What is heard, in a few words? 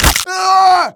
flesh break; Team Fortress 2